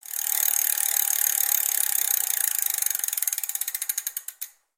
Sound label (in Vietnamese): am thanh vong quay may man ngan